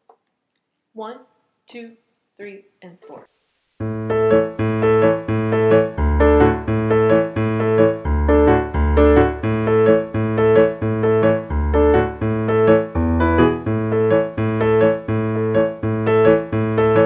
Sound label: piano